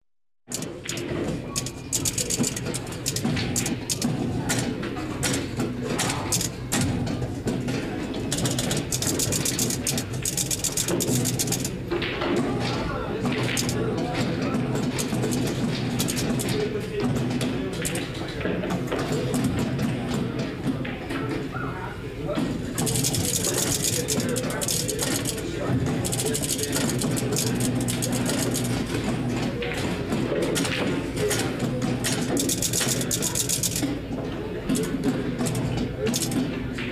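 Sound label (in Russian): игровые автоматы автомат с мячами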